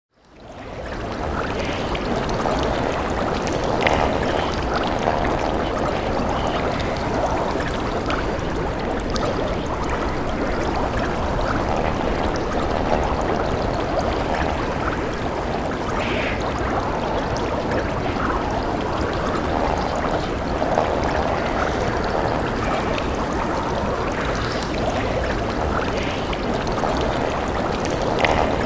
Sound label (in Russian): zvuki v himicheskoj laboratorii